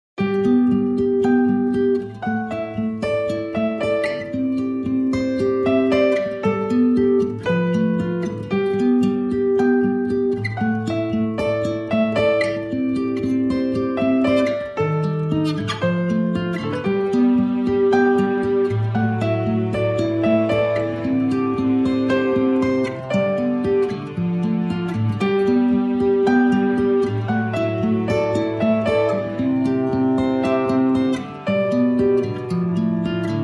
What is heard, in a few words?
String Me